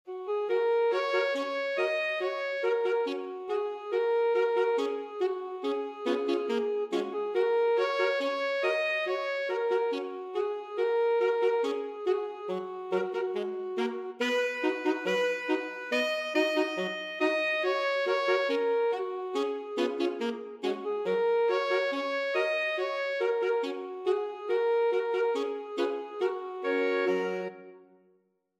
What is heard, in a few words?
alabama, alto sax quartet